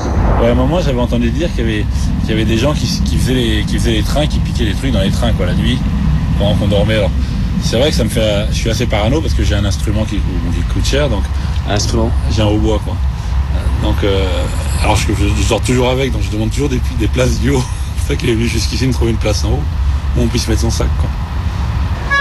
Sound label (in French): Train de nuit joueur de hautbois